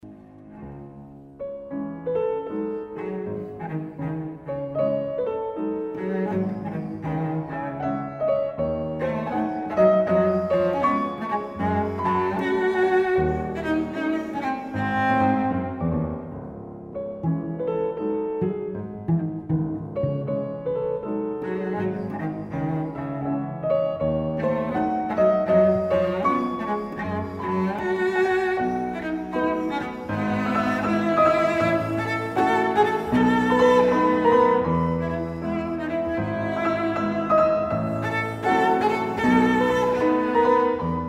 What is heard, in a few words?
cello son; reinecke